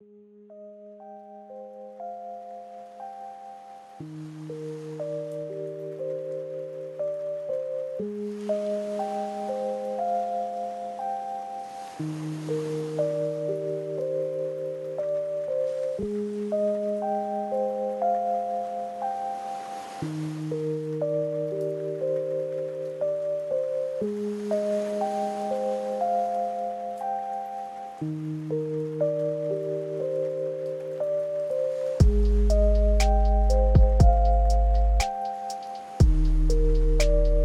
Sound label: Raining At The Beach, Nature Lo-Fi